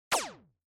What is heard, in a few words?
TV Turn Off